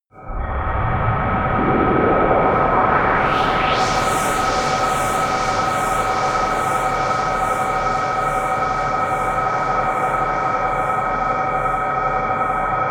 low buzzing ping pong rise, Risers; whooshes rises

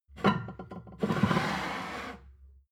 plate stack slide table, gastronomie; soundpool

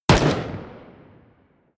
pistol distant shot; schusswaffen

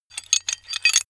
metal handling pieces

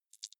click noise, signale

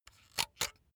razor retract comb; soundpool

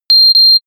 notification pager

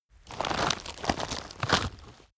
rotten wood crack; foerster

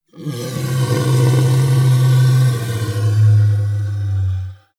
monster inhale low; kreaturen

